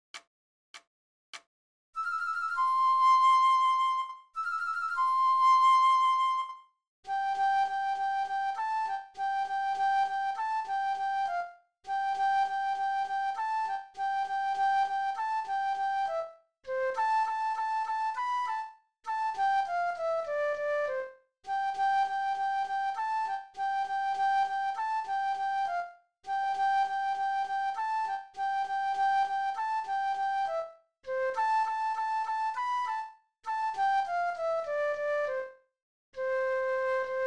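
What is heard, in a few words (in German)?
Floete, 100 bpm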